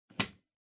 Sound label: Power Strip Adaptor Floor Gentle Unplug